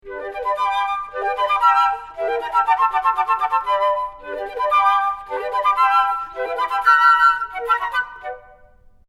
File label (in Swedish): trio flojter; notknapparen